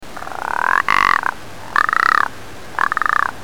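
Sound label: rock ptarmigan; raichou; toritori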